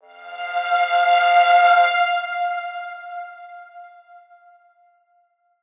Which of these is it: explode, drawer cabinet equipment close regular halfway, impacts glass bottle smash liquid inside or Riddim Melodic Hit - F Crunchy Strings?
Riddim Melodic Hit - F Crunchy Strings